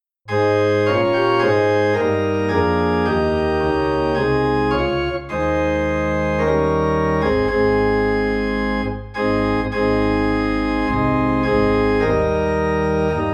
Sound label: Organ in A Flat; O Come All Ye Faithful; christmas